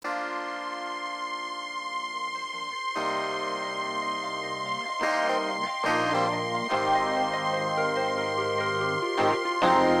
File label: FUNK; FUNK WAV BOUNCES VOL 2